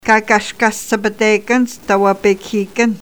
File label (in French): guitare; contenus autochtones; sports et loisirs